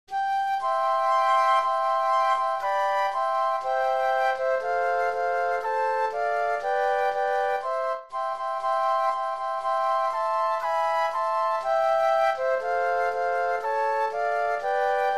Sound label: Flute Trio; christmas